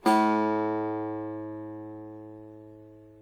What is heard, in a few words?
medium, tanbur